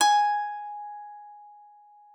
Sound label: G#5, psalter; Xsample VOL-8